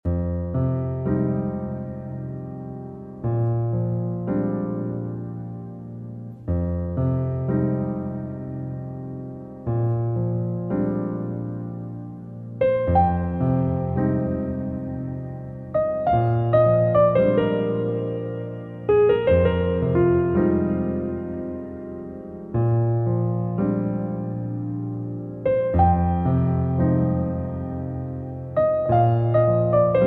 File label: waltz; erins waltz